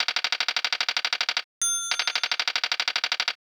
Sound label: eat